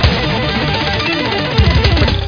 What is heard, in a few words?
riff, music